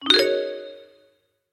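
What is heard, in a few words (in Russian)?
звуки для викторины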